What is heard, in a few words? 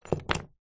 household padlock small set down on wood; zapsplat